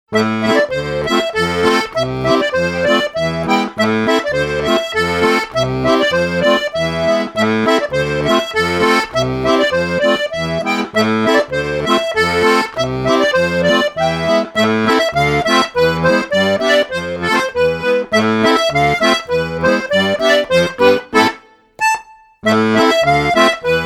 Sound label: Hanter dro